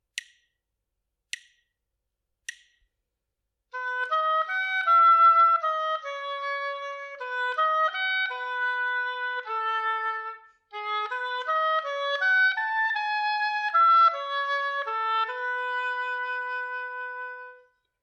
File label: melodic dictation; eartraining